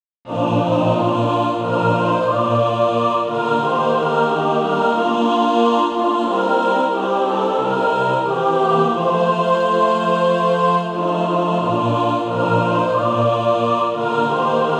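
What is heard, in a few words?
mixed, Practise Music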